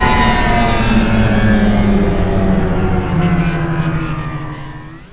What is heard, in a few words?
xtal down, ambience